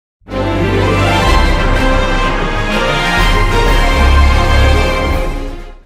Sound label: this isnt a victory fanfare